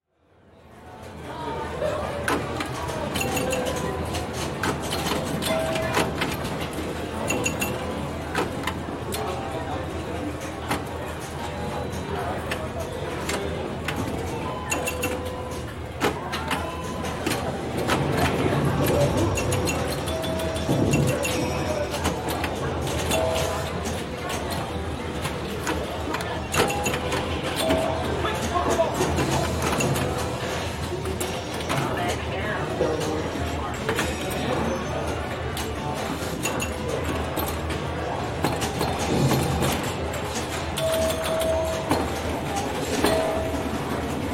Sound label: arcade ambiance